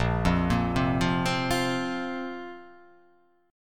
Bb Suspended 2nd, Bbsus2 chord; arpeggiated

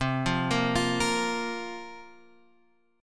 Bb Suspended 2nd C

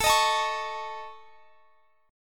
C sharp Suspended 2nd Sharp 5th A

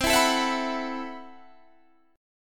A Minor 6th Add 9th C, Chord

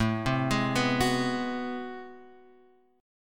A Minor Major 13th, down

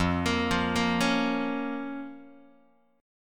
F Suspended 4th Sharp 5th, down, Guitar; arpeggiated; x,1,1,3,2,0; JGuitar

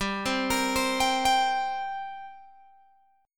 G Minor Double Flat 5th, Guitar, Open G; chordshape; down

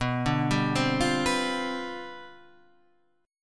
C Major 7th Suspended 2nd Suspended 4th B, chord

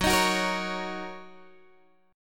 Fsharp 6th down Guitar Open G

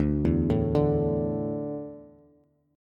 D Suspended 2nd D down Bass; arpeggiated

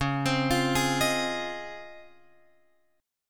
C sharp Major 9th

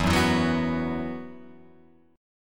E Suspended 2nd, Guitar Open F; Esus2 strummed; 4,1,4,1,2,1

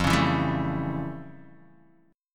F Augmented Major 9th Guitar Open D

